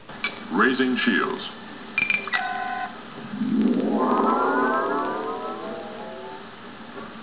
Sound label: shields